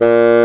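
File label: bassoon A#2; East West - Global Sounds; WOODS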